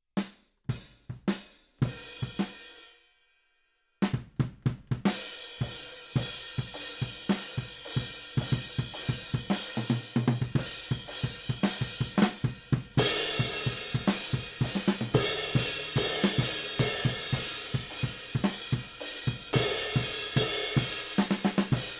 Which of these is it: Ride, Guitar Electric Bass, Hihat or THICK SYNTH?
Ride